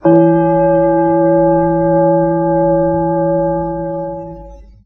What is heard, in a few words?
gong